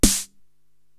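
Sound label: Snare Drum, Drum Machines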